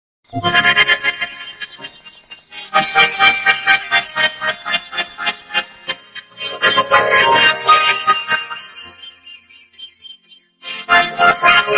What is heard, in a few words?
laugh rage